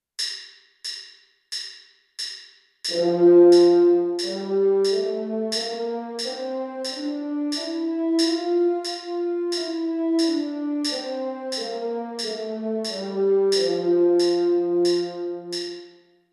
c major scale french horn

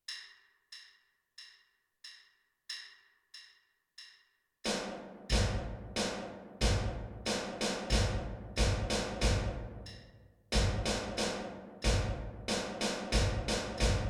snare drum bass drum

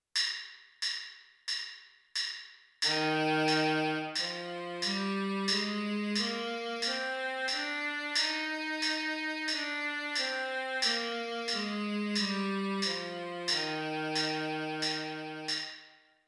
c major scale baritone sax upper octave

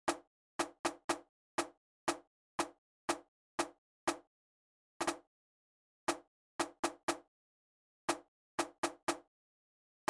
snare drum

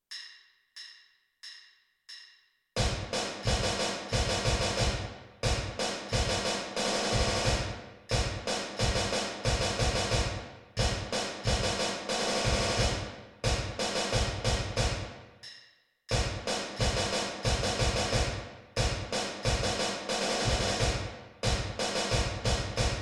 major scale snare drum bass drum

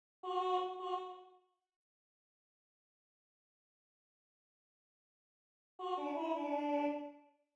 all sopranos; bars 36 - 43

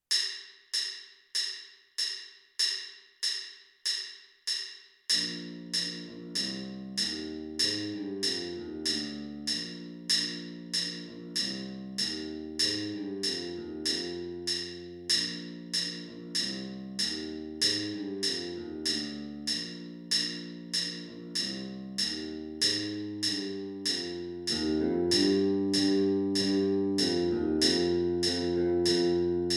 electric bass

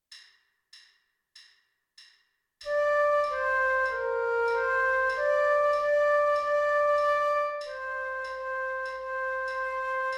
flute

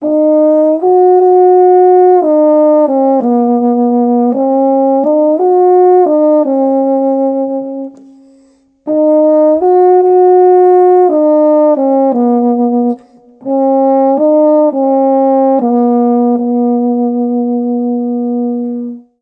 euphonium baritone

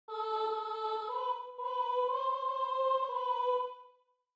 soprano

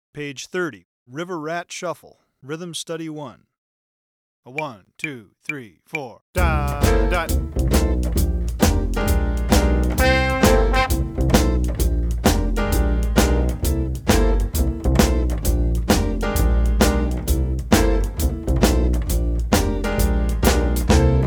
shuffle rhythm

